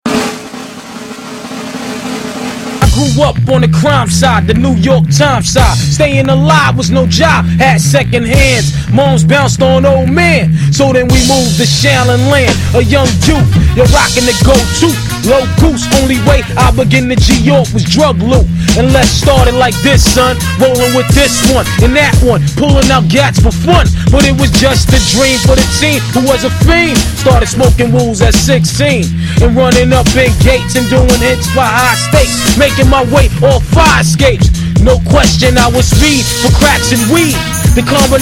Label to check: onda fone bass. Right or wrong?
Wrong